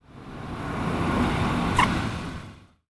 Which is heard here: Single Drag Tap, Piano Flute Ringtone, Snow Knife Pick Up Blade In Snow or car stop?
car stop